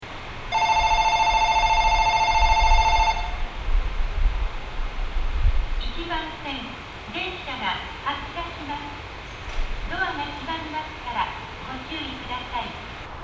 shinkiba bell; jreast

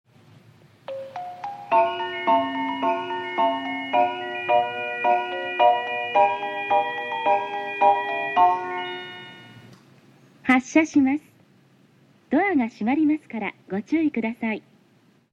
higashiomiya melody; jreast; utsunomiya

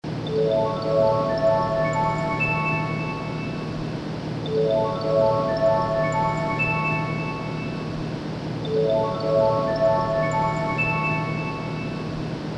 shinkansen sound; tokaidos; jrcentral

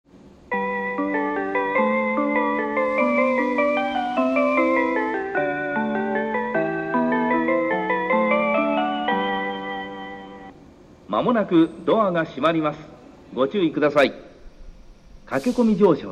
1 melody, station; kitasenju; joban; jreast

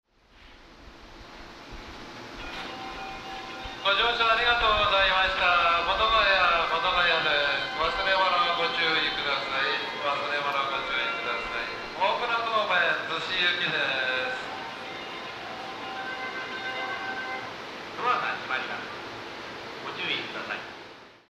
hodogaya melody; jreast; yokosuka

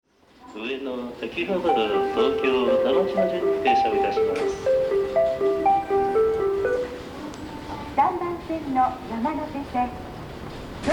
tabata melody; 田端駅; 3番線（山手外）発車メロディ; jreast; yamanote

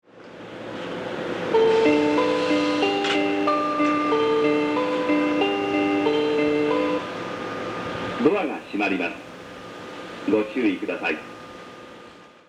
kaminakazato melody, station; jreast

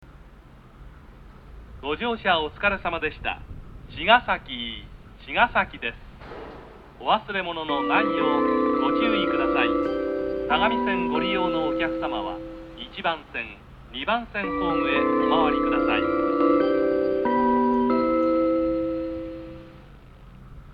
melody

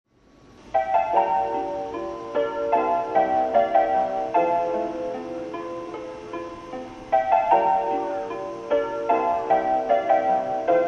melody

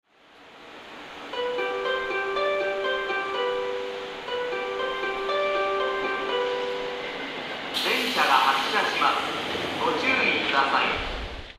melody; 2番線発車メロディ; seibu; nerima

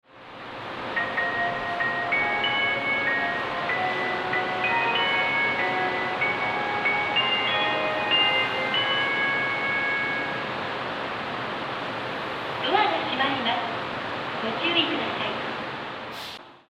melody, station

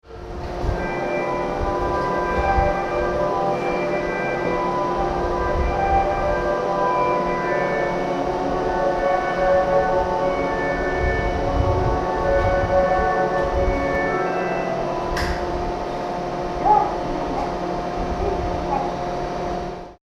melody, station

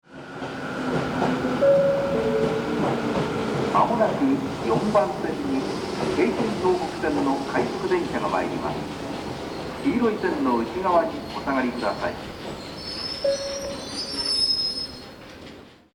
tabata; 4番線（京浜南）接近放送; jreast yamanote

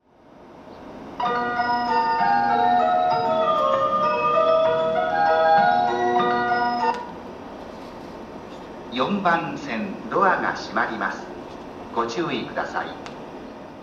saitamashintoshin melody; jreast; utsunomiya

